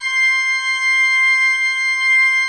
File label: C5, Cathedral Organ; ILIO; Propeller Islands Cathedral Organ